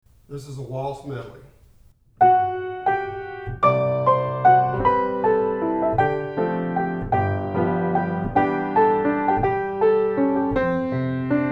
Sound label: Waltz Medley